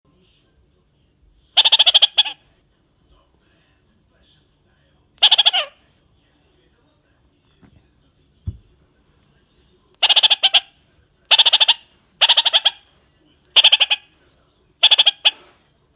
popugai kakarik poyot, parrots, cyanoramphus malherbi

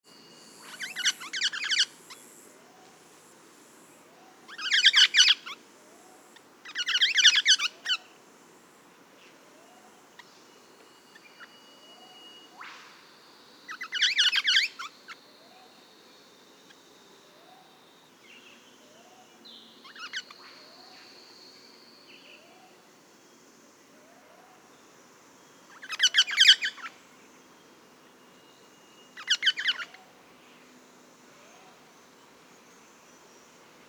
blednogolovaya rozella platycercus adscitus, parrots; platycercus eximius

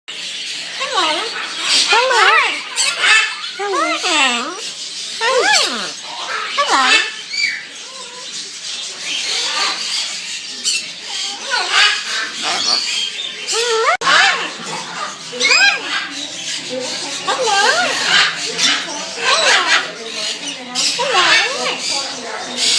zelenokrylyi ara govorit; ara chloroptera

